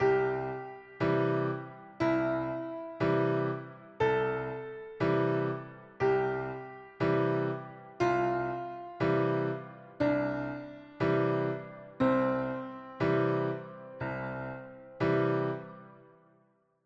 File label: warm pad gentle rising 5ths